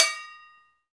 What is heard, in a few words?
Agogos; Club-50 - Foundations Roland; Fijidera